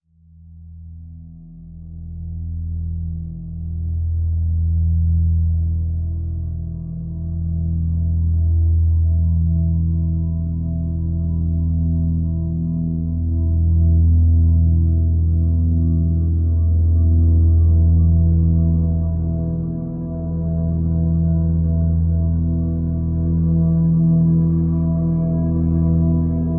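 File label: drone E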